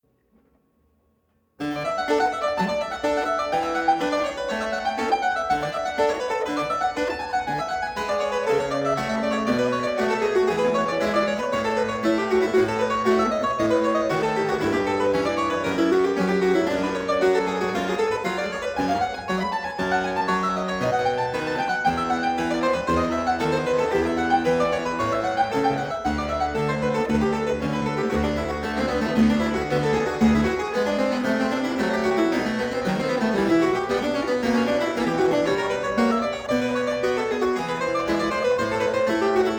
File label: Flemish harpsichord